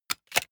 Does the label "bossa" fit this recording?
No